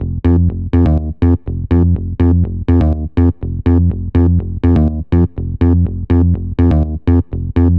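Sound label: 123 F major Electric Bass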